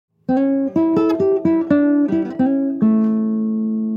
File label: Guitar Lick